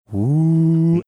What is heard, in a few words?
making printer whirring sound